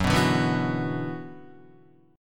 F sharp 7th Suspended 4th Sharp 5th F sharp down Guitar; F#7sus4#5 strummed